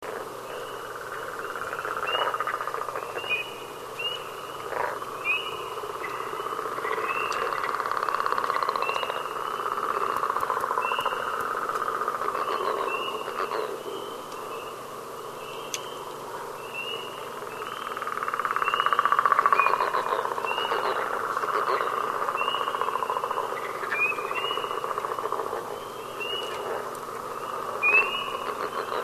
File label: northern leopard frog call